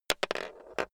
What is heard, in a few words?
chess piece drop